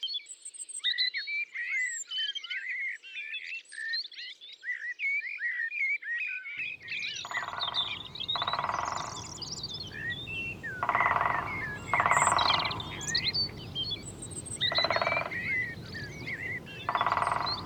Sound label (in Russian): dyatel